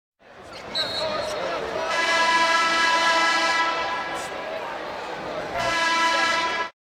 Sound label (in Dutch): Hoornsignaal Bij Basketbalwedstrijd; KMP Film Video Sound Effects